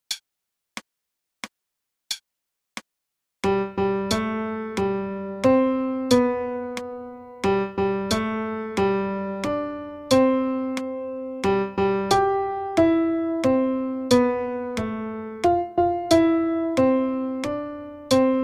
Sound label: Happy Birthday 90 BPM